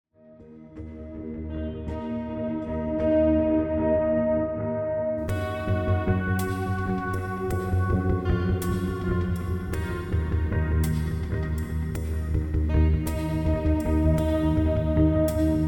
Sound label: pss470